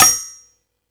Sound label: Thundadome, E-MU Pro-Cussion